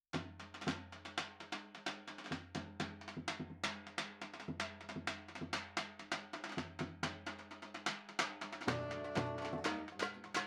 second tenor sax

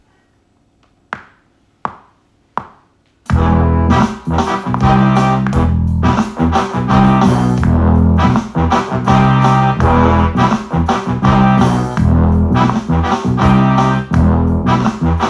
Greek like No clarinet

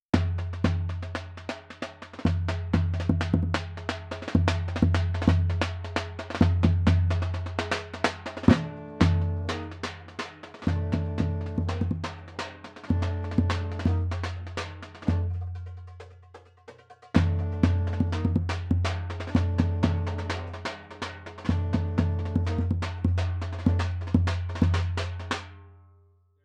bass drum low

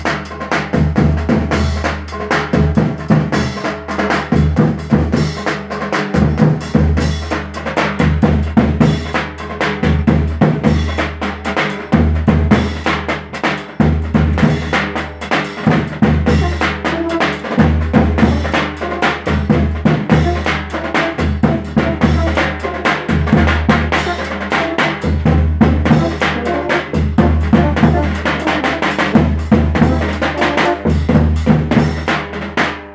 drums, Rehearsal Recordings

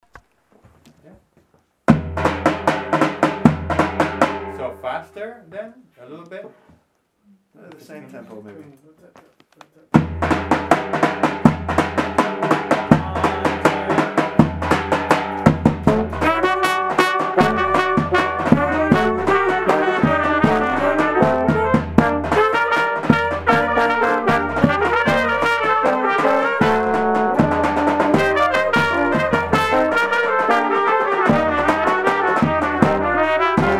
cocek rehearsal

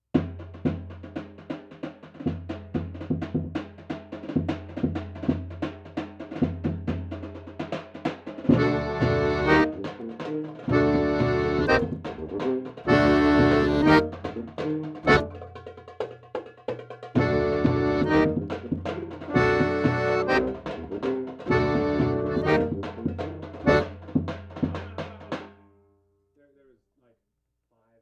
accordian DI

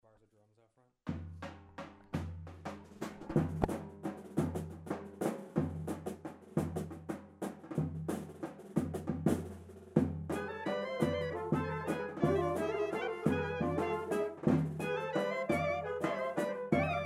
melody and drums rehearsal

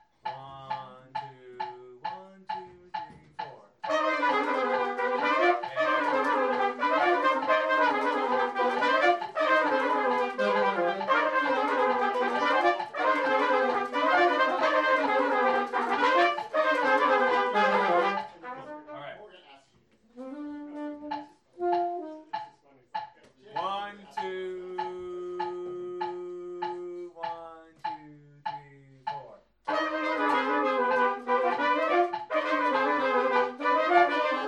ciganko moja melody relax rehearsal

